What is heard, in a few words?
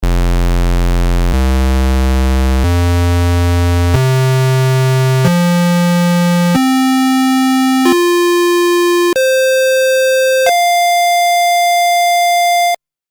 Juno Square